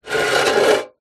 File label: metal box small empty slide scrape concrete; zapsplat; foley